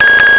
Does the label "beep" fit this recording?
Yes